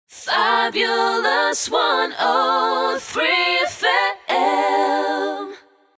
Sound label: Jingle Acapella